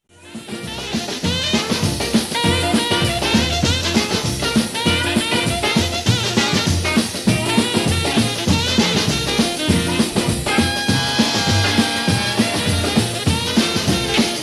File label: instrumental